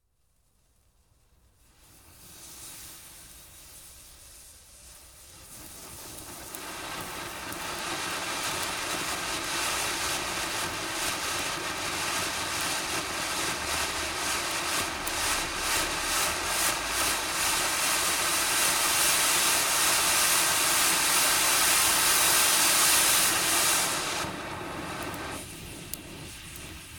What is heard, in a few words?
Fireworks, Retrojet Fountain; Firework Sound Effects; BBC